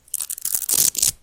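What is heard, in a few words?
tearing a short piece of velcro fastener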